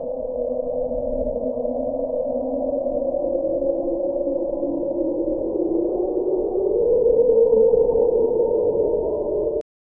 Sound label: desert ambient